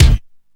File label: wet kickdrum sample D# key